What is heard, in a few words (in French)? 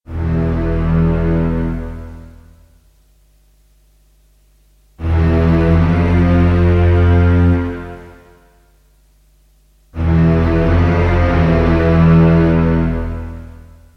musique de film